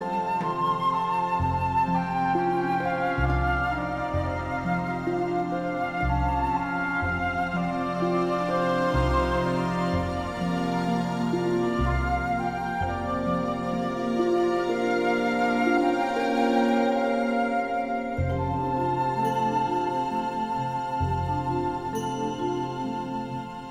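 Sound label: flute; rimckij korsakov pesna indijskogo gosta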